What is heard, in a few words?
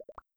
chat mention